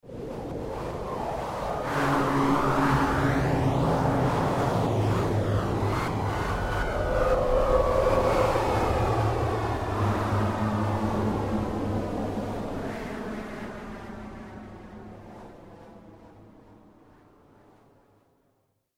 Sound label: Evil Wind Gust Horror Vocal, Evil Wind Gust Vocal Scary Dark Ominous Demonic Whoosh; Ghosts